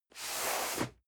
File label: cardboard box medium slide; objekte